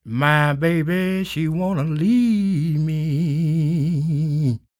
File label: SING, Blues Soul; Spectrasonics Vocal Planet